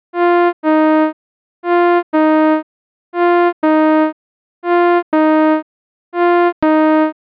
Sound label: Envelope Attacks